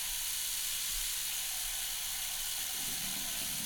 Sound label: sink running; hurrycurry